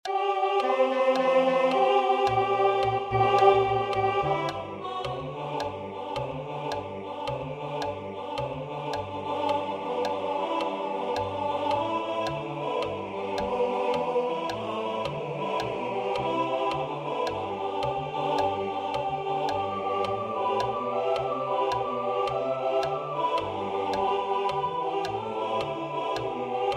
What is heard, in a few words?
Alto; Sourwood Mountain